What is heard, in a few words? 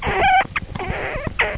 squirrel